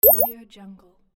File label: digital pop